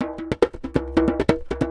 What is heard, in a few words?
Djembe loop 140 BPM; musicradar